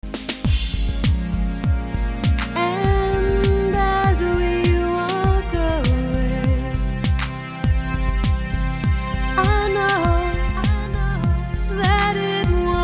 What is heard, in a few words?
vox